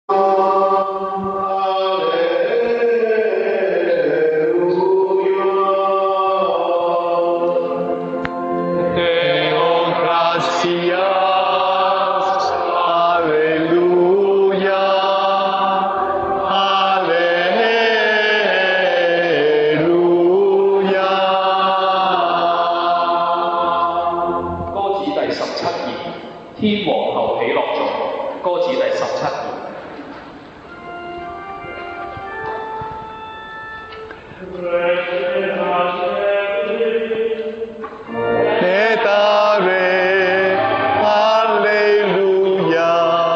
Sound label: Regina Caeli, Latin Mass; Easter Mon 2011